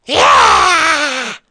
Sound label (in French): RATOCRI; Mobiclic46